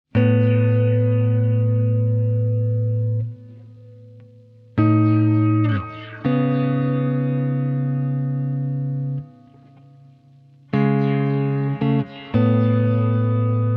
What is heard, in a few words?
space arpeggio, handsonic; teraecho